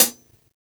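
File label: closed hi-hat, Roland MV8800 drum kit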